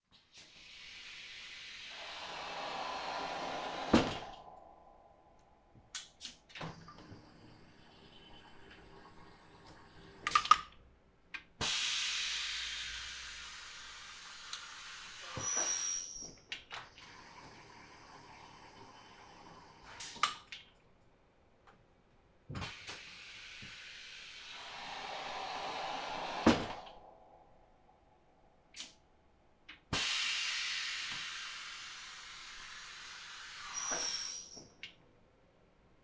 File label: paraprint automatic parallel lift screenprinting table pneumatic frame raise; kippax